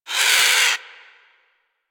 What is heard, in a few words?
Avichaea Tranq Dart Grunt; Warframe; Hunting Critters